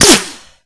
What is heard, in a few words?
weapon impact stone; Spearhead Demo